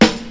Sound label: nineties hip hop snare drum sound a sharp key, snare single hit tuned to